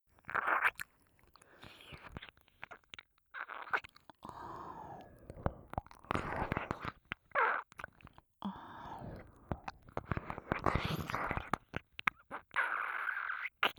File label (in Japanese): 耳舐め目覚まし; 寝起きフェラ